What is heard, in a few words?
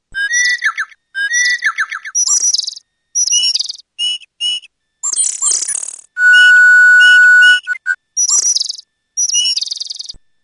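bird, Japanese thrush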